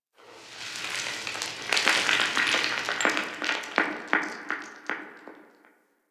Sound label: Settlement Sand One Off; Ambience; Warframe; Grineer Settlement